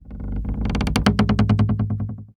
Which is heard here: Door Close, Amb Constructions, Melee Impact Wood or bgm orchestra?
Amb Constructions